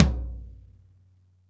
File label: DRY TOM LO, ACOUST TOMS; Greytsounds